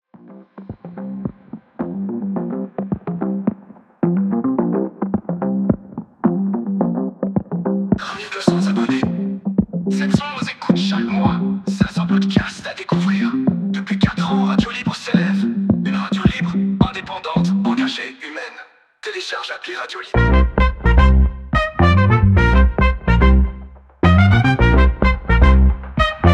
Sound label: Electrofunk; promos songs; Radio Libre